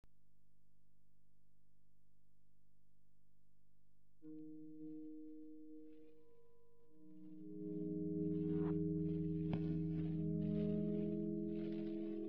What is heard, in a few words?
Ocean loop for guitar and cable